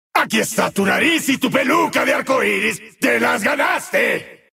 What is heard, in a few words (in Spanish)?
fiddle payaso